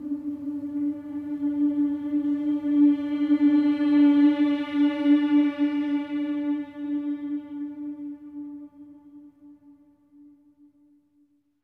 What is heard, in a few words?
MM D4; SWELL MMS